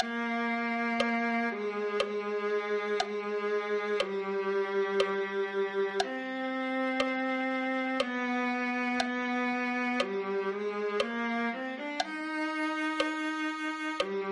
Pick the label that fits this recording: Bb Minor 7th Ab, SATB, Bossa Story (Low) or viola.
viola